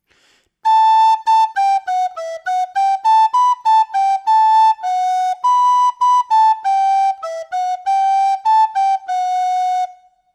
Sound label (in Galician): picado, Pito Galego; articulacion